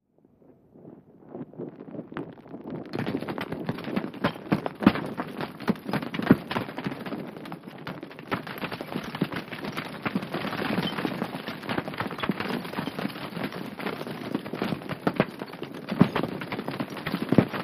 Avalanche, Many Large & Small Rocks & Debris Falling; Avalanche 2, ( 1 )